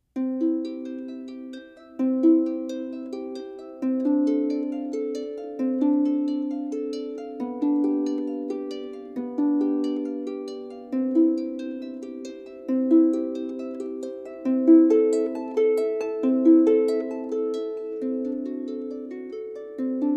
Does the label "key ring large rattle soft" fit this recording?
No